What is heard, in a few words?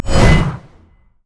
thundering blow; LoL; jayce